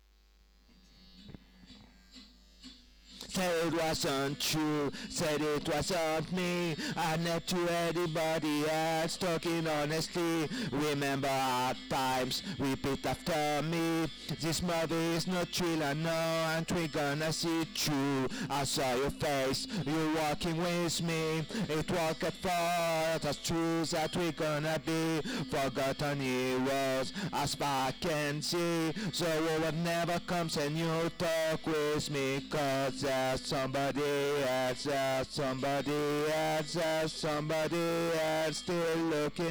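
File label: Vocals Verse 1 Chorus 1 Chorus 2; REMIX KIT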